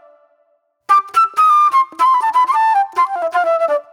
Club Flute

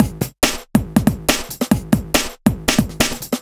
Break Beats, 140 BPM

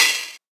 Tamborine, 707; classic machines; 707mod

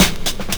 DRUMLOOP, Ragga & Dub Reggae

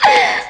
Laugh; Zero-G Jungle Warfare 1, 2 & 3; 90 s Sample CDs

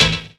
chord A; Techno Trance Essentials; CHORDS

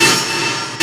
MACHINES

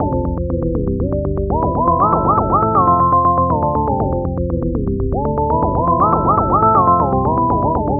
Lead, Modular Electronica; Sonic Foundry (Sony Creative Software); TSS Autopilot

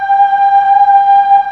BOYS G4; MEL BOYS

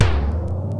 Tom, Fairlight CMI III

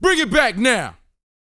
VOX, Vocal, R&B Dance, HIP-HOP

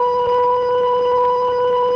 OPTIGAN B2; Sonic Reality; Vintage Timetraveler

